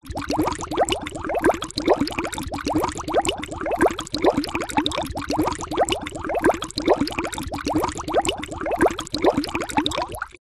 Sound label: nature water bubbling small